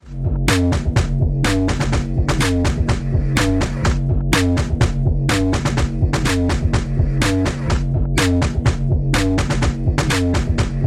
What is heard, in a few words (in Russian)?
muzyka v mashinu bass boosted